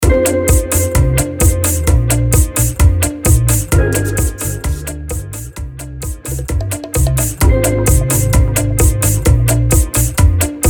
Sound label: Intro Outro Break 130BPM